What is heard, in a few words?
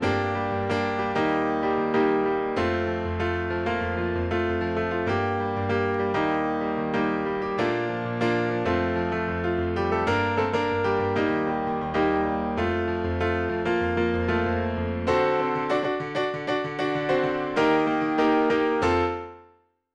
Piano